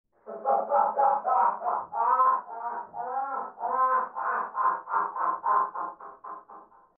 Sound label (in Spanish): fondo risa